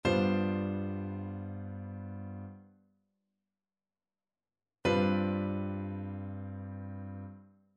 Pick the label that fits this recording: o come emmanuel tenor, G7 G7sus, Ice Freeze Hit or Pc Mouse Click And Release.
G7 G7sus